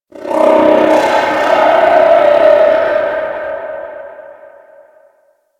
Infested Hive One Off; Ambience; Props; Warframe; Corpus Infested

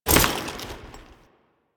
Foley Step; Warframe; Kahl

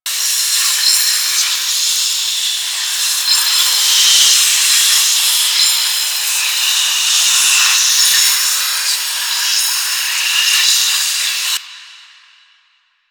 Feather Loop; Warframe; Jade Shadows; Quests

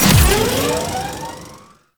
Mech Run Start; Nechro Mech; Vehicles

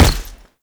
Heavy Step Default, Footsteps; Enemies; Foley; Warframe; Tau Dax

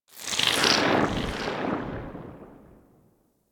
Sentient Start Move, Enemies; Sentient Movement

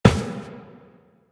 Run Glass, Footsteps; Warframe; Tenno

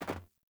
Sandals Land Metal, Footsteps; Characters; Player

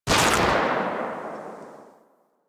Rifle Shot; Warframe; Weapons; Thanotech

